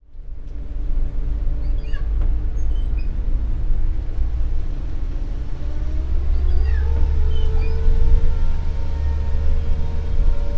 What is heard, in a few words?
Air-raid Bus